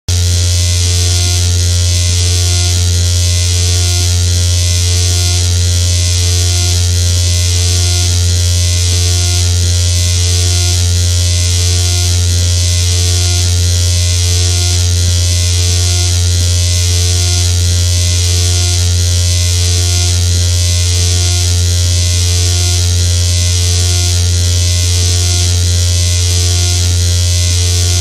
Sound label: Morning Noise Broadcast